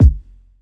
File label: kick; eddyflux; crate bd; dough-samples